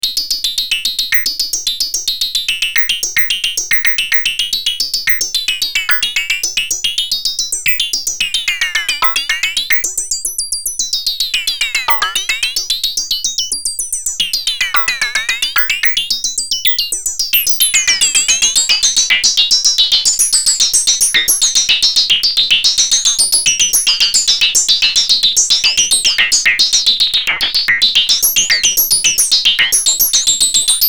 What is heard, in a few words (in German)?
analoge RM + Resonanz; Dominion 1